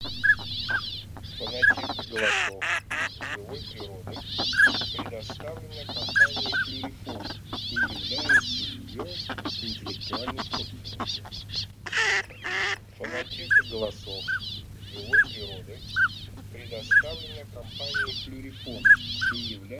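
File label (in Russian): Шилохвость Codone, манок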